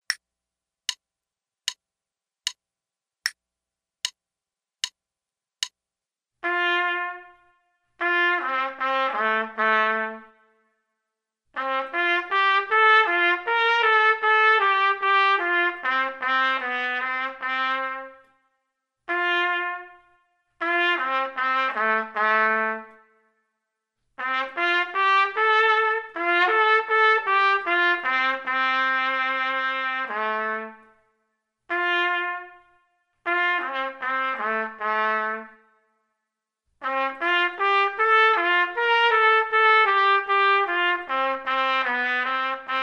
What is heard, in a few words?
trumpet 2, Larghetto; metronome setting for this performance is quarter note = 120; Selected Duets for Trumpet, Page 14 Number 9, Larghetto, performed by David Summer.; Trumpet 2 Only